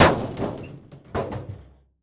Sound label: Impact Metal Garbage Can Hit Car Trunk